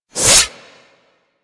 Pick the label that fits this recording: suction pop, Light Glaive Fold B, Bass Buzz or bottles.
Light Glaive Fold B